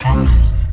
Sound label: Hoofed, Hits and Bits, Drum Bass; Zero-G; Total Drum Bass